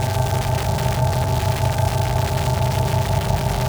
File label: Sci-fi Electricity Hum Loop; SFX Kit; Sci-Fi Loops